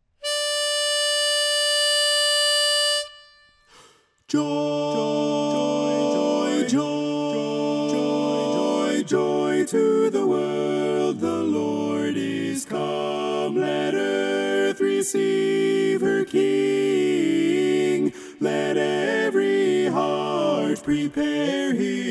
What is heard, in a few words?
TENOR, Joy To The World